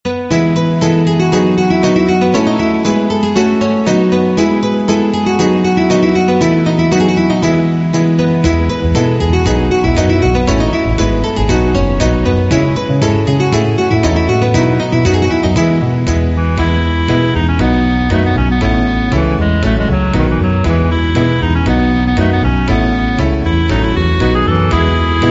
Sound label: bgm; danceline; RubikCube